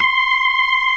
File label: ORGAN, NO PERC; Keith Emerson Signature Series; C3